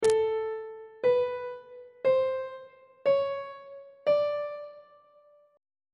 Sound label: g double sharp 3rd intervals on treble clef